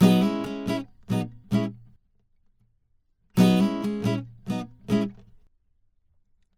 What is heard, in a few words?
070 Pop Ac-Guitar C#Min; Pop Rock; Acoustic Guitar; Pro Tools Essential Loops; Digidesign